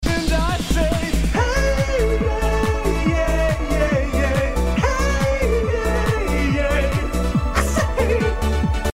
heyyeyaaeyaaaeyaeyaaa

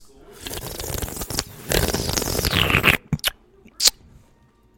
butt slurp

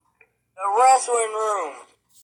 wrestling room

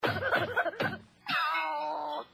noid laugh ohhh

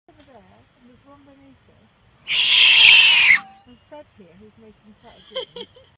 groundhog screaming

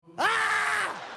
exasperated scream

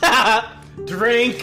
hahaha drink

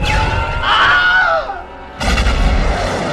death cutscene; coruscant; lego star wars

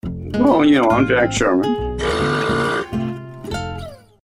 burp; sherman

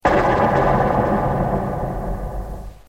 thump

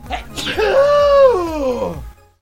bam achoo

